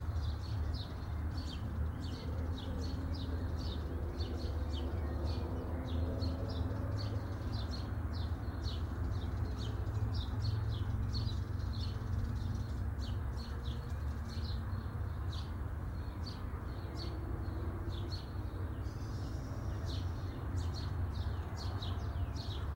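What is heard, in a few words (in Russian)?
далёкие редкие не громкие звуки птиц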